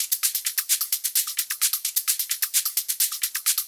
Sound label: Live Percussion, 130 BPM